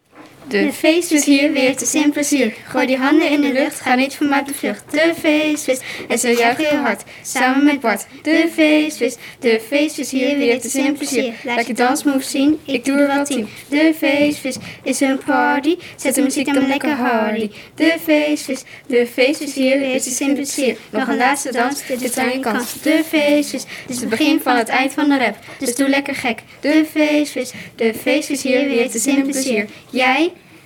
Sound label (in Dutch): rap